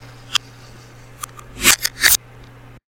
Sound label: can reverse